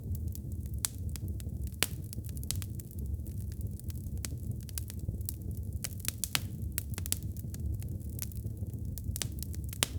realistic indoor fireplac; air flow

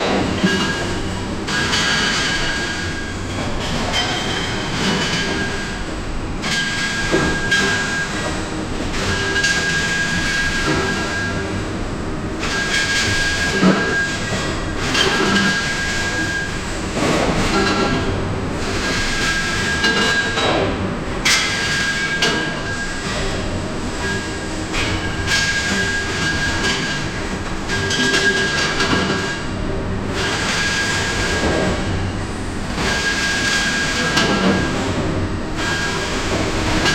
mechanical sounds of meta

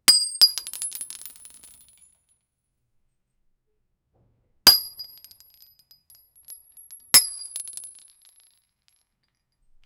indoor

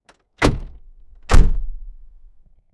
car door shutting

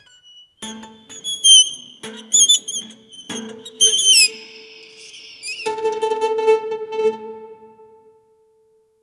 sharp staccato strings hi

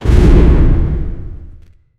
game audio

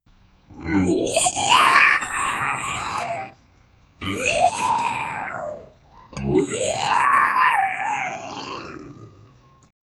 chinese zombie demon war, demon

